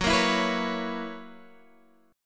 F sharp 11th F sharp Guitar Standard; strummed; JGuitar

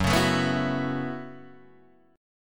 Gb Minor 11th Gb Guitar Open D

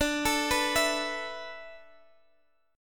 A Suspended 2nd D

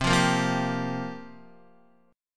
C 7th Suspended 4th, Guitar, chord; C7sus4 strummed

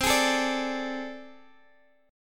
A Minor Major 7th Double Flat 5th C

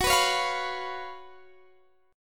Gb Major 7th Suspended 4th, Guitar Half Step Down

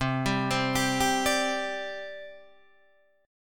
C Suspended 2nd, C, down, Guitar, Open D

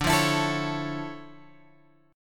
D Minor Major 9th D, guitar; DmM9; {10 8 X 9 10 9} strummed

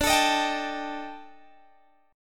Eb Major 7th Flat 5th D, guitar

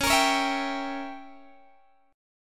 Db Diminished 7th, Guitar, Open G

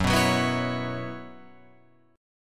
Gb 7th Suspended 4th, Guitar Open F; Gb7sus4; strummed; JGuitar

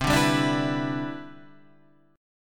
E Minor 7th C

